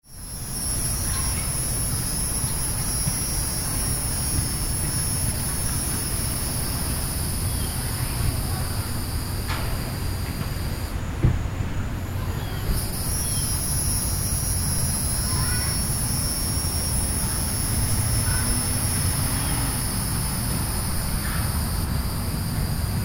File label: Suburban Park